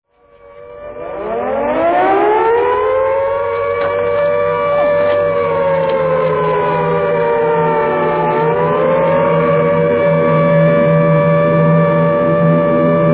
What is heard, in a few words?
Air Raid Siren